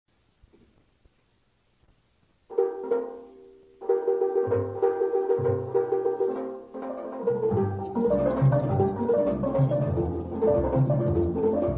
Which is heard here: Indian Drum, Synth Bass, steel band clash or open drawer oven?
steel band clash